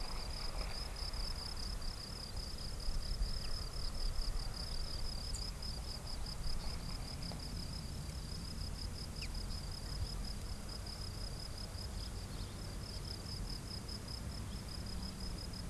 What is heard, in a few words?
Ambient, Quiet, Day; rayman raving rabbids playstation 2; Prototype Ambient